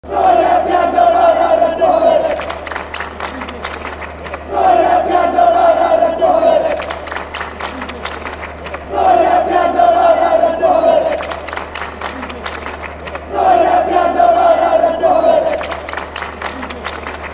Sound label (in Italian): Novara Nel Cuore, cori curva nord